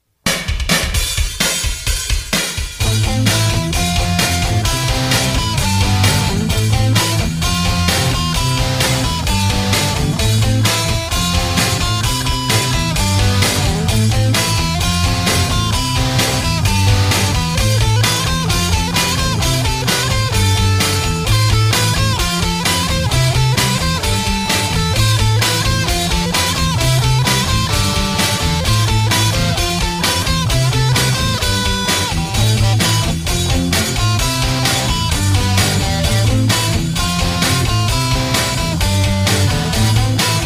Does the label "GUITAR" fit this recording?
Yes